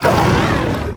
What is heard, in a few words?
cry; arcanine; hisuian; cobblemon; pokemon